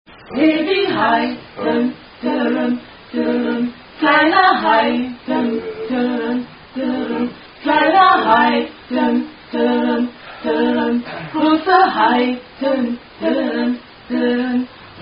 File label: German Attack Song